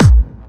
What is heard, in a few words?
Harder, kicks